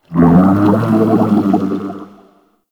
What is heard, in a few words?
Inked Humanoid Large Attack; Warframe; Shadowgrapher